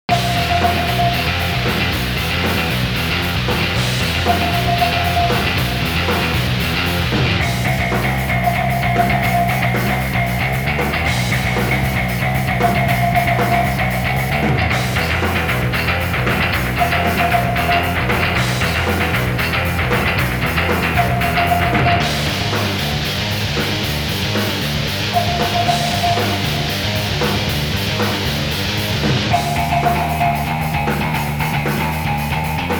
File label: Alert; Touhou Splintercell Code R